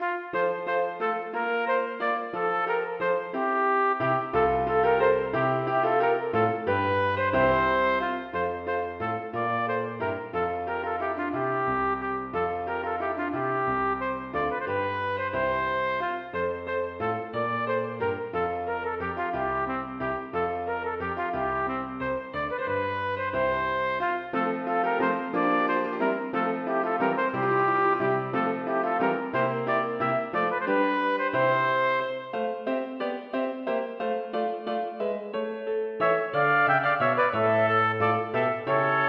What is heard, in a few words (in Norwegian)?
Paspied for to trompeter og piano; Aamodt